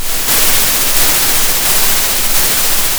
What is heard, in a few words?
pop, Sound of a thunderstorm